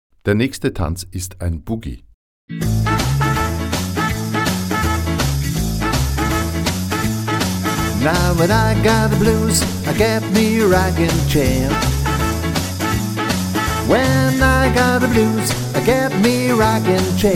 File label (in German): mit Ansage